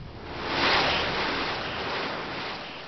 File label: Rushing Water; Cheesy Lo-Fi Sound Effects